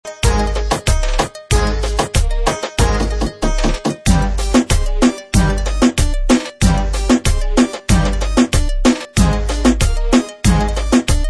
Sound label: Reggaeton Beat